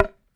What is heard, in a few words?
Thunder Drum; Sonic Foundry (Sony Creative Software); The Electronic Music Manuscript Collection; Battery 3 Sample Kits